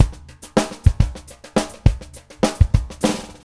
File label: Drums, Jazz